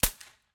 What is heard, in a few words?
c02 shooter outdoor gun shot